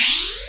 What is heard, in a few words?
Bonus rise; Blastmorph Pinball; xbox series xs